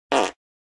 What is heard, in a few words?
fart